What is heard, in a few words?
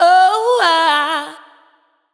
vocal adlib classic exclaim Bm